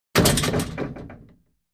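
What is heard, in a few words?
Gym Weight Equipment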